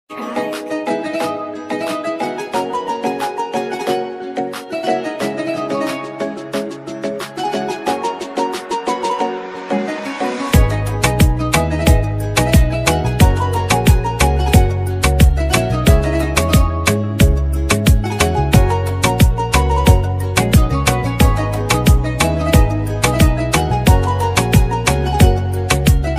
arabic ringtone islamic ringtone turkish ringtones bgm ringtones